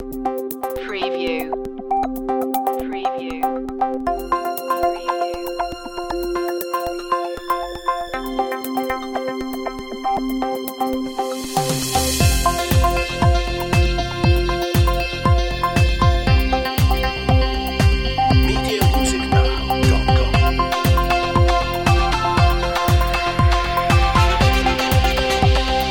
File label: atmosphere